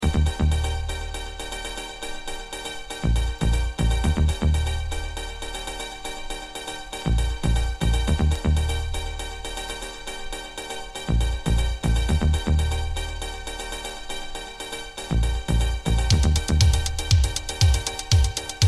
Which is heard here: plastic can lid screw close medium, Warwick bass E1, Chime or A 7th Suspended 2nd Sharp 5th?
Chime